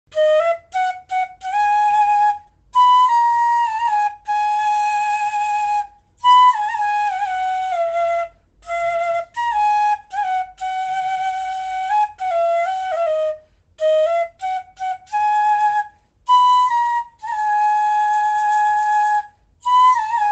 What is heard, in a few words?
Flute Music